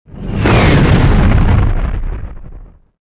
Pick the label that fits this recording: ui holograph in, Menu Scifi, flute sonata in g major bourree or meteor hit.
meteor hit